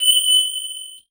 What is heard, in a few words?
Beep High Pitch